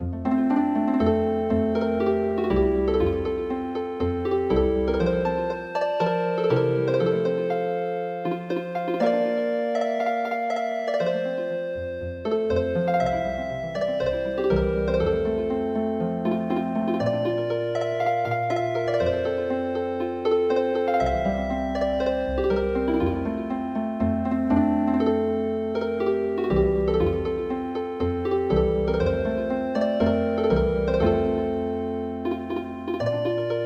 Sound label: Sarinande Healing Harp